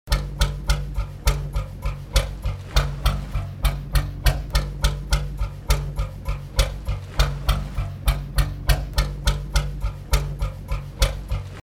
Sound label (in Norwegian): Wichmann tomgang, Modelboats; Scottish Fifie